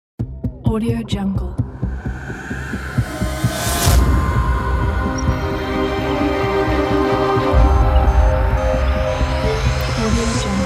sci fi mysterious intro logo

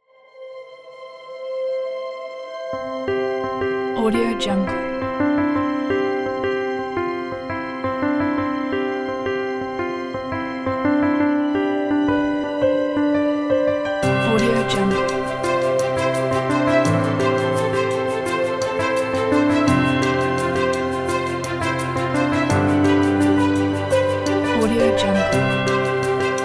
inspirational cinematic

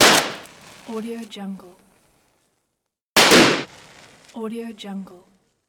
petard

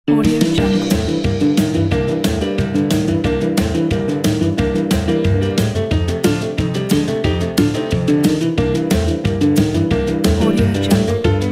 boogie upright

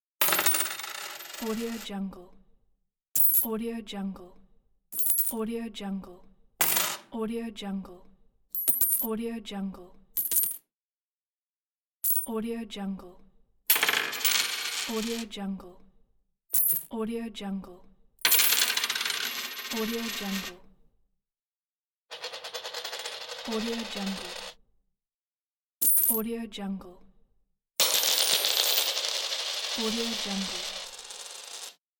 coins

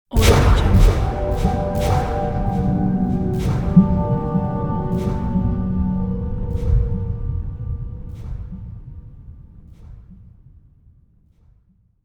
dark intro impact

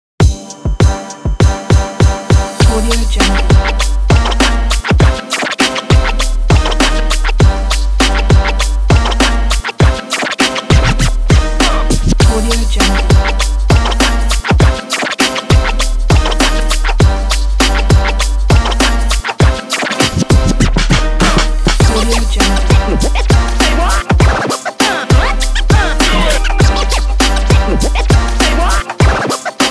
light hiphop style; quantumnoise

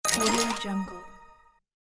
buy

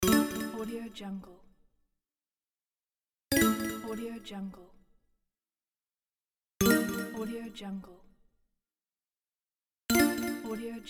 quiz correct pack

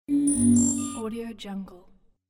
scifi hitech interface; volkovsound

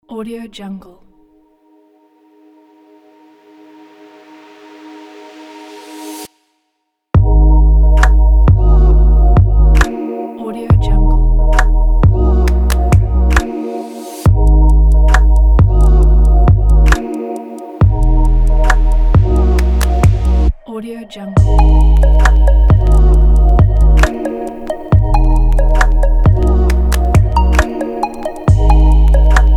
deep abstract beauty beat

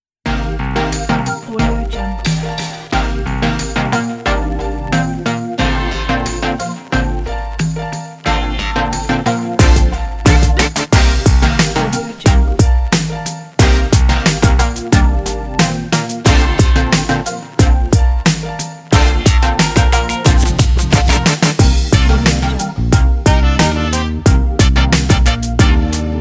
beat hiphop

